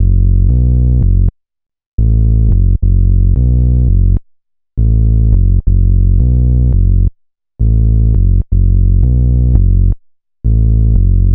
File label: Forg Bass, Drum n Bass; KJ Sawka; Drum n Bass & Beyond